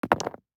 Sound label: DICE on Neoprene, Throw and Roll, Standard, 3 Three Dice; Samples; ArticulatedSounds; DICE Sfx Party (Extended)